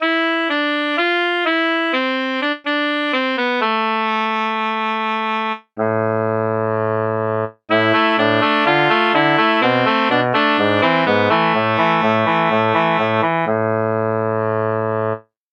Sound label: soprano sax